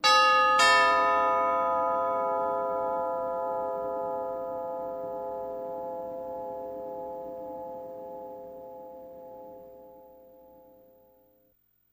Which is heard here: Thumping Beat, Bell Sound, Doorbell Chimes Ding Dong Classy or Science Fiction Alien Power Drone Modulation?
Doorbell Chimes Ding Dong Classy